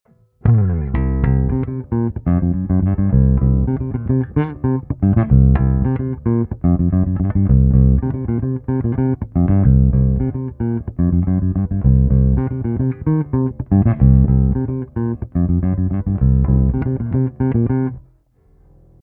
Midrange Fingerfunk

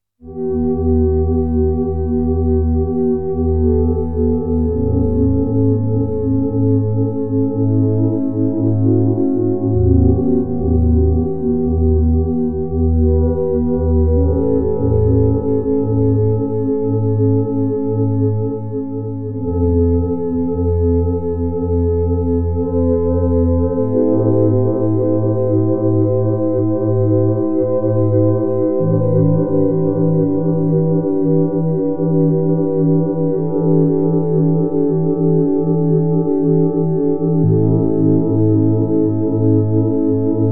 jup6 vocal pad

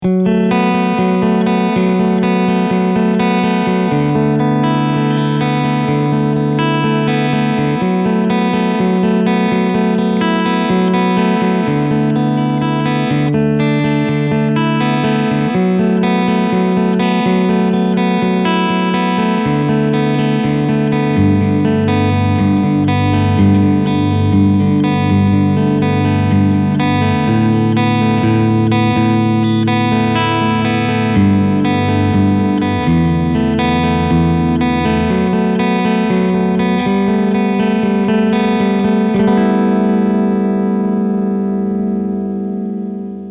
Cleansound MEDIUM Reverb

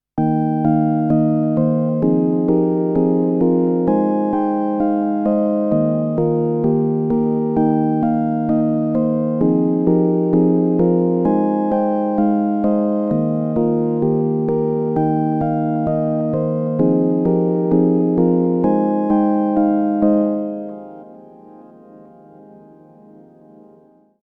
ASM Hydrasynth Lullaby

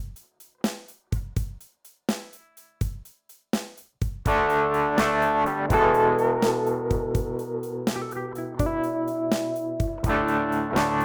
Zoom G11 Blues

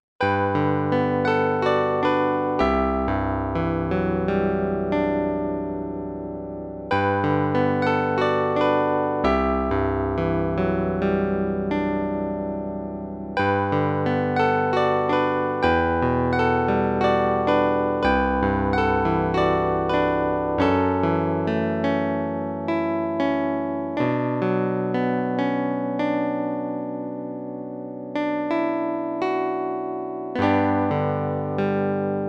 Roland MKS-10 piano tremolo

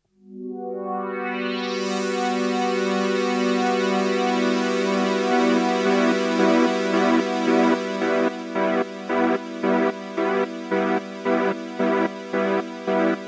Strymon Time Line Tremolo Square Synth

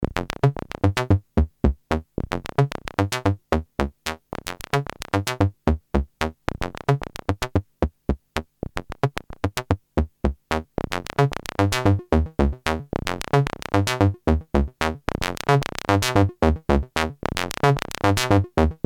EMS Logik

